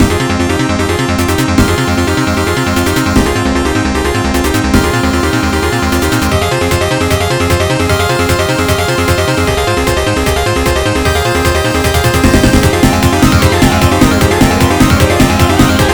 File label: Magical Chimes; TouhouGenesis Vol1